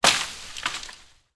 impact log fall on leaves twigs; sound effects one

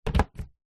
bin small plastic flip lid open; household; zapsplat

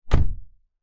suv door close gentle internal nissan patrol

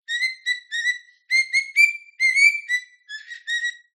bird cockatiel song; animals

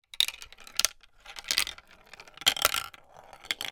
toy marble run marble down plastic track

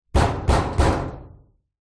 impact metal wall fist; zapsplat

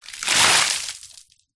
nature water pour splatter concrete; zapsplat

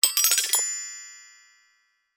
toy glockenspiel keys played by child ascending

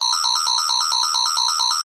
science fiction alarm loop

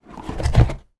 game monopoly game box lid remove

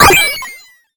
science fiction robot punched ko short circuit

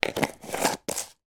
food jar gravy granuals lid on close

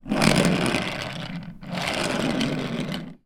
toy car plastic roll wood floor; zapsplat

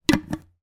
household jar glass candy close

